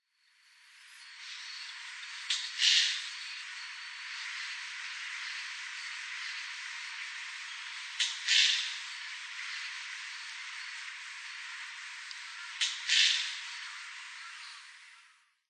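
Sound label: Striated Heron; ササゴイ; 巣での声; 栃木県宇都宮; 平野敏明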